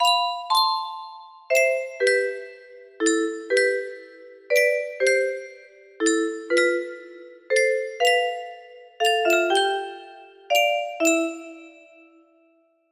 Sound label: haunted music box